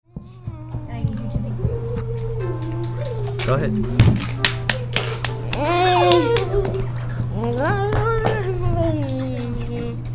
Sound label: Vocals